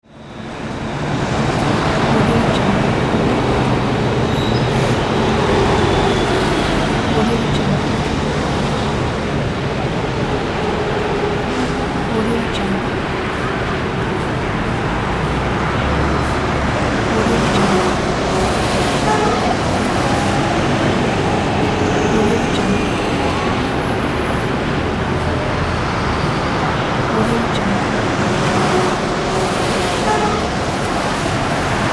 city

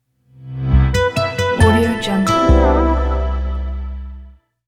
friendly service logo

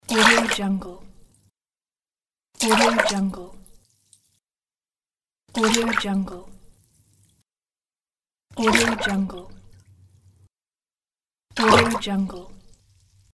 water splash; soundfood

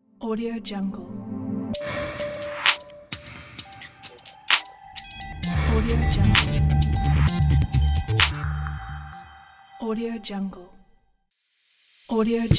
chill modern tech logo pack

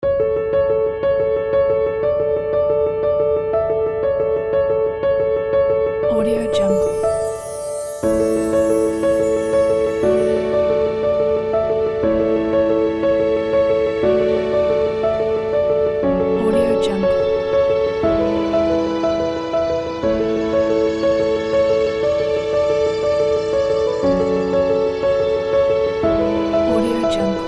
breezy spring piano background

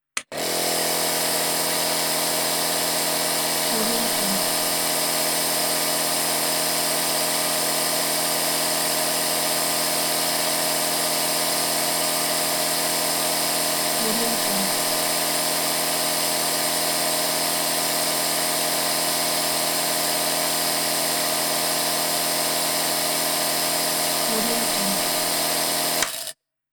inhaler

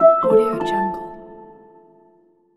modern dry alert

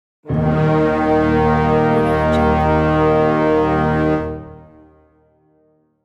dark war horn

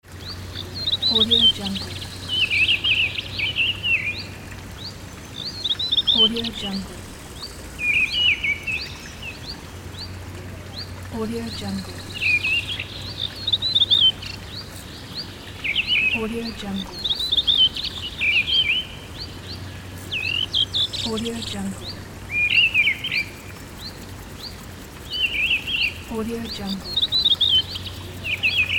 nature

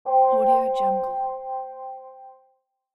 new message

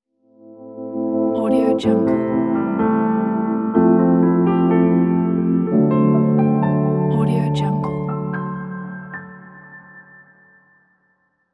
gentle chilled piano logo

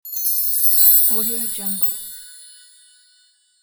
diamond sparkle

